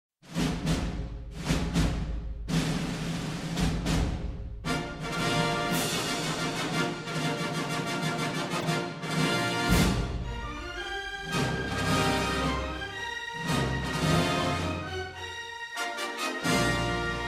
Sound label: logo animation and fanfare; 20th Century Studios; since 2020